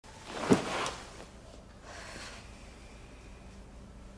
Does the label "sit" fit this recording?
Yes